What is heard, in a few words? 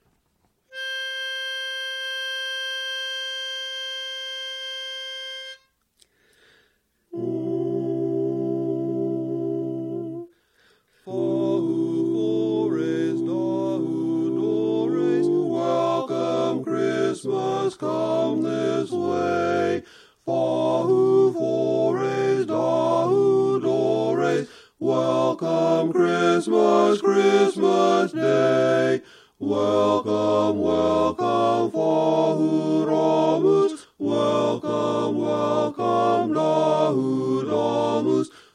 Tenor; learning tracks